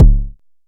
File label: analog tonal, Kickbank